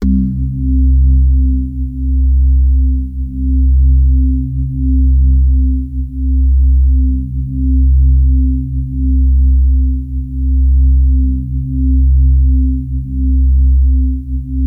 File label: Access Virus B; Synthworx